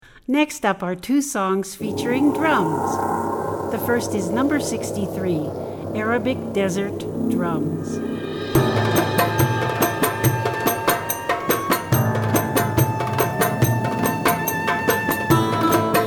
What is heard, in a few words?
Arabic desert drums